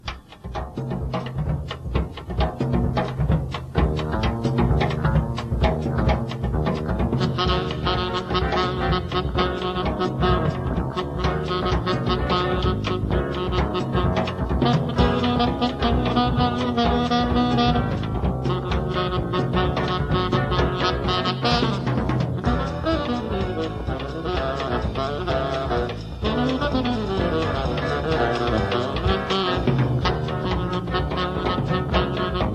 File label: Quartet, Jazz Band